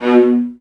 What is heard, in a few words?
VIOLAS C#3, Strings; ILIO; Synclavier Strings